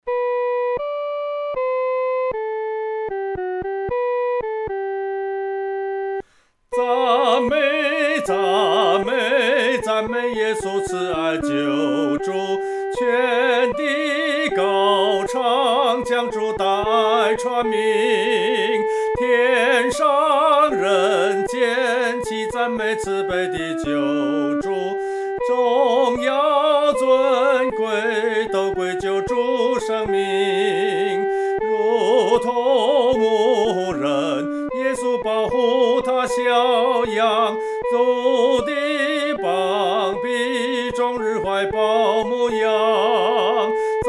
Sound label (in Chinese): 独唱 第一声; 赞美耶稣